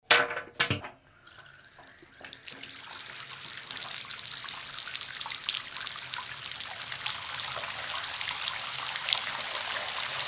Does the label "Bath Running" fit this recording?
Yes